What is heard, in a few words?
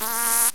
fart; fairlight cmi